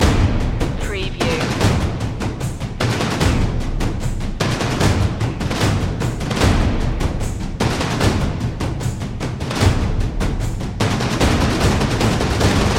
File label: toms of tension loop